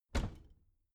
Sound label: bathroom cabinet doors open heavy; objekte; tueren